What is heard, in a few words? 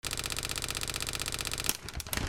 OFFICE